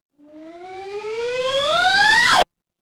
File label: transition riser